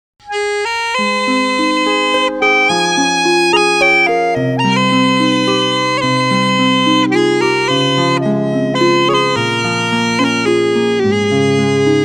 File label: uilleann